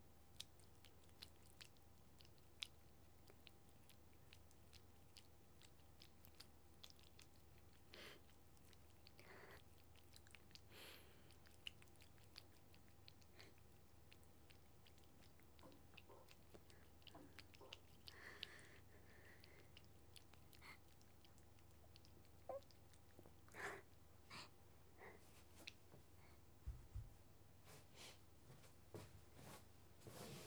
水音; NGシーン

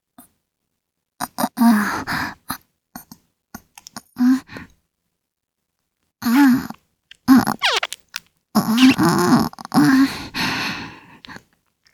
くちびる合わせ; キス

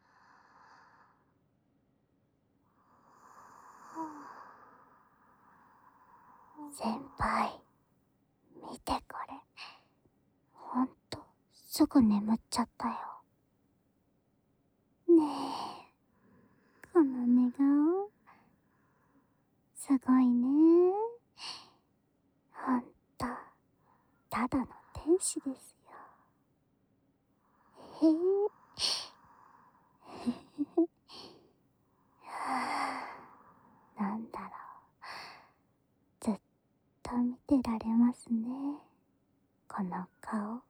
添い寝

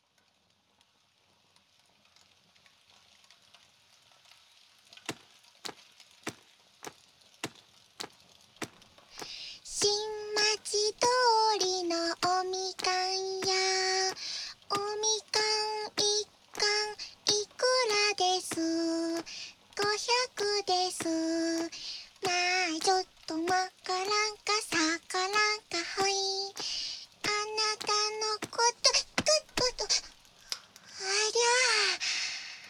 耳かき（右のお耳）; あやかし郷愁譚 ～送り雀 ひよ～

